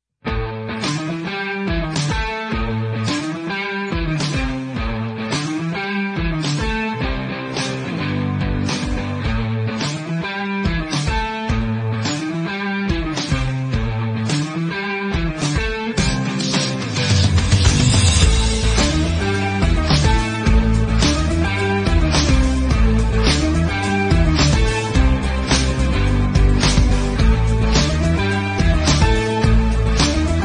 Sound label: indie rock